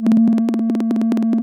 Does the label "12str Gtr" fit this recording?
No